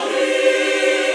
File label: choir